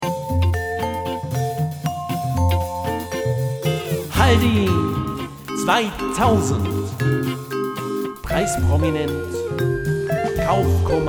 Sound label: Jingle; Haldi 2000